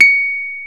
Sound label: PNO, Rhodes Mk.V; Keyboards of The 60's and 70's